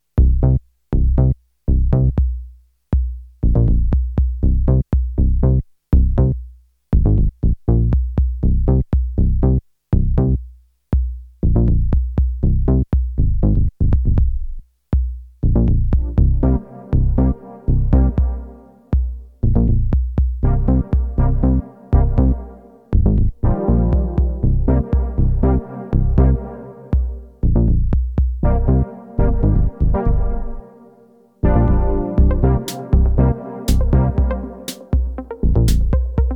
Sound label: Disco Stue Mix noise